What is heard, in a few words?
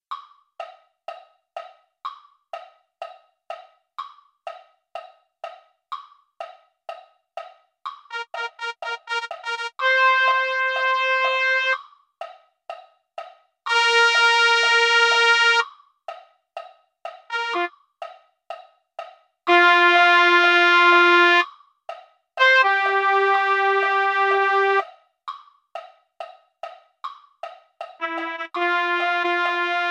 trumpet; concert corps